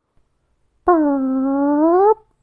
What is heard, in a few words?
airhorn